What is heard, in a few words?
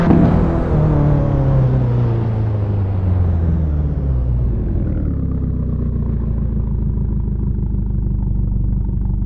slowdown, vehicles cars; astonmartin cygnet; lwcars